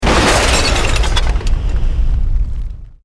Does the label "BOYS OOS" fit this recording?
No